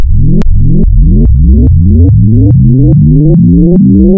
FM Simple Sine Index 1, wavetables, Frequency Modulation; Architecture 32